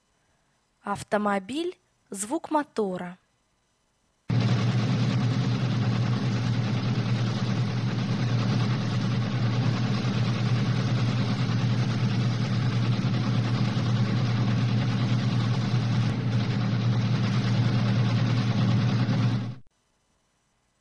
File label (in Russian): Автомобиль мотор